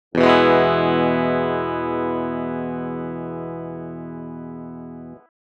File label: SYNTHETIC E major Pickup Bridge, electric guitar; electric guitar synthesis